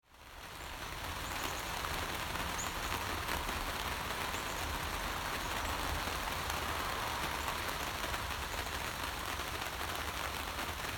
Raindrops Thunder near the base of Roaring Mountain